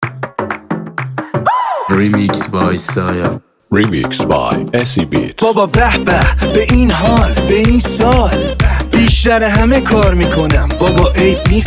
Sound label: noroz remix remix